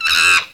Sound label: CHIMPANZEE; ANIMAL FX; Greytsounds VOL-1